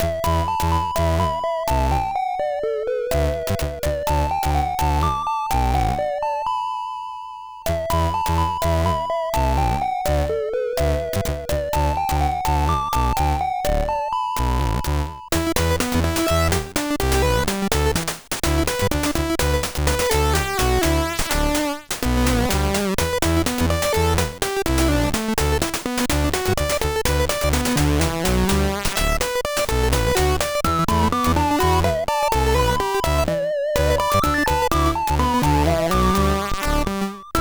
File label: Bzzz sid CSG8580R5